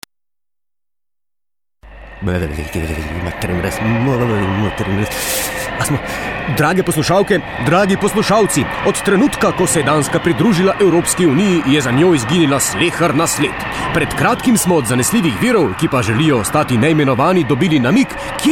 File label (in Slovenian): DANSKA JINGLE